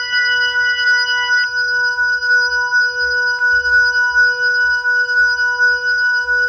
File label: Organ Lick; Ambient Grooves; Organs Licks